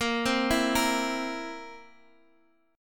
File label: Bb Suspended 2nd Flat 5th, Guitar, DADGAD; Bbsus2b5 arpeggiated; x,x,8,5,7,8; JGuitar